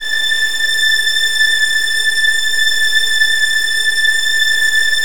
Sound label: A4, Virtuoso Strings